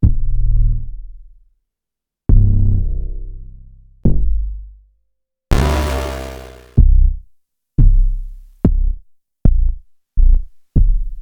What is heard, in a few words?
Bass c